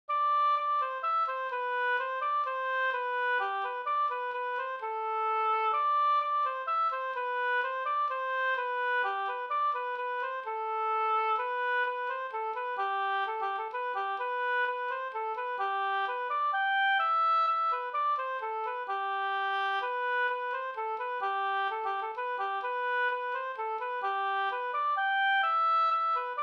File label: polska